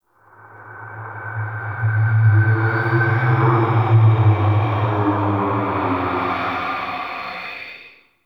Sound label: Ghostly Whoosh; Ambience; Warframe; Man In The Wall